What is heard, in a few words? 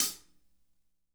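hat closed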